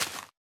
大型垂滴叶向下倾斜声

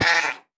山羊受伤声

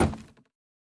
竹木悬挂告示牌踩踏声

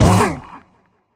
受伤声; 监守者

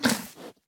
哞菇进食声

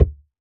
泥坯踩踏声

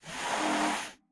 嗅探兽嗅闻声

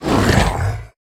劫掠兽咬声

